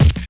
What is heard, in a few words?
BD; E-Lab; X Static Goldmine 1